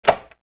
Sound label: Mechanical good word; arbor; puzzles